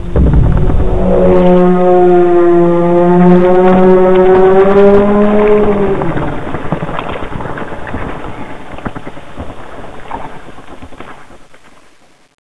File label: death